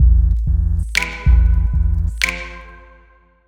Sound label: Beach beat